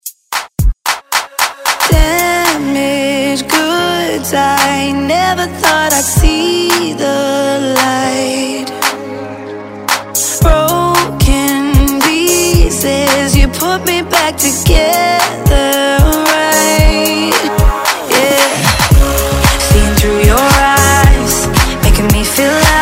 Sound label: top40; Redrum